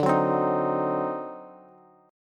D 7th Flat 9th D down Bass; D7b9 strummed; JGuitar

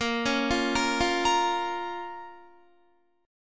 Bb Minor, Guitar; arpeggiated; 18,16,15,15,17,17; 4E,4A,5D,5G,5C,6F

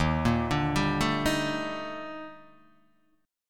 D sharp Major Sharp 11th, Guitar, Open D

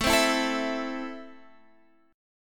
A Minor, Guitar, Standard; Am strummed; x,12,10,9,10,12

